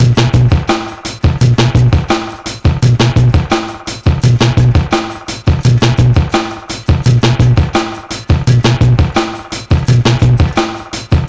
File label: 85 bpm, Breaks; THERE U R; POTBreaks